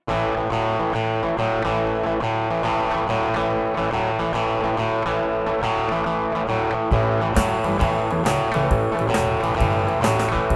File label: Trio Blues; digitech trio